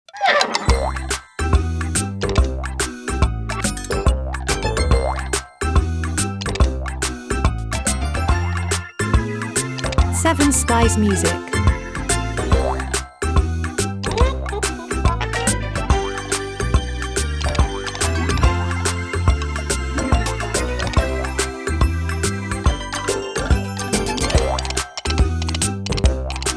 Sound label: commercial